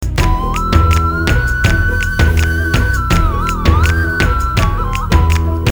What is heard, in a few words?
depressing rock music